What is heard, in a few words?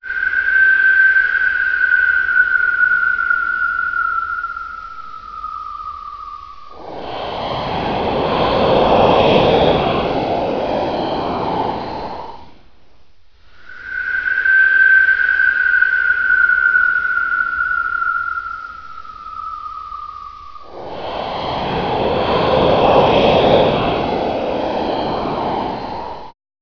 snore long